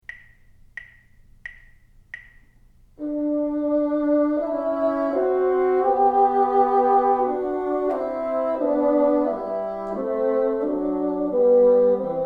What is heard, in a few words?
together, bassoon